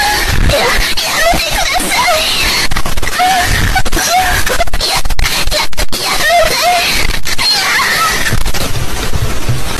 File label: funny haha lol